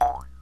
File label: JEW'S HARP